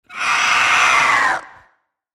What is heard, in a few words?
Squeal; Maneater Boar